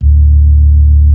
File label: B-3